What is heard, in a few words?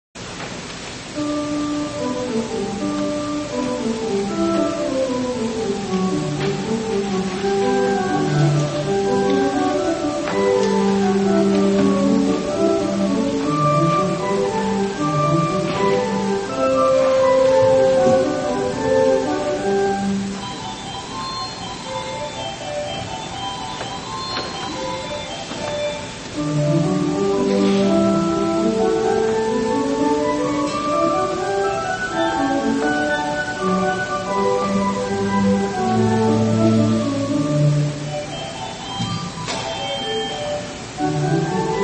Sonata in G for organ